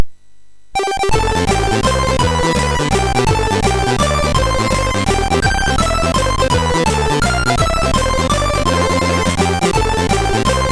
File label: Happy Birthday, MOS6581R3; hvsc